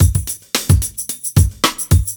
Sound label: Beat 110bpm; musicradar